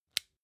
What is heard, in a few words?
flashlight release